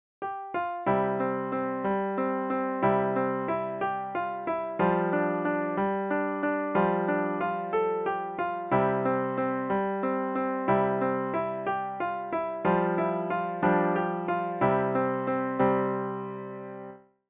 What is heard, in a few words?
easy piano solo; irish washerwoman